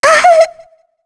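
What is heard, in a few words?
Damage, Korean; voices; heroes; Shea; kings raid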